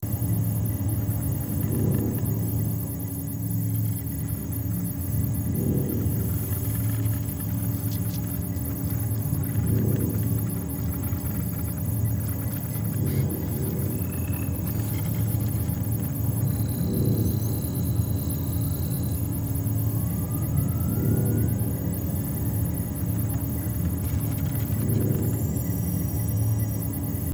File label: uav flyby; exploration